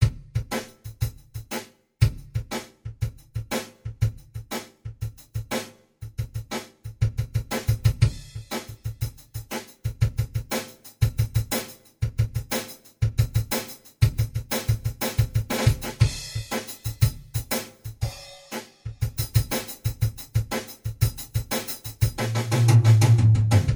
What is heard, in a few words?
drum In Small Room